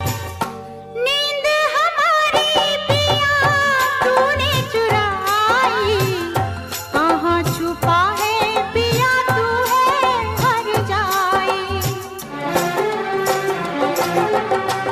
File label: Old Ringtone